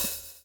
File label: swing